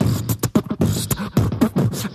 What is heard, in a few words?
stylin, Beatbox, Drum loops